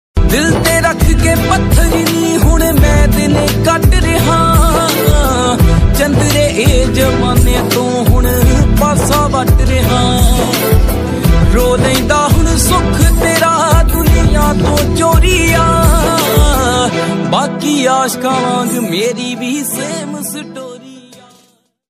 punjabi sad

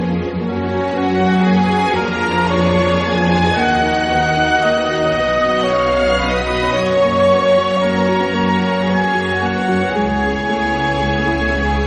italian ring

